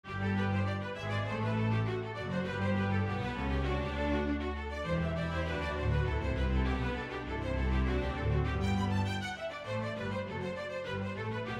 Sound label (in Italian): Allegro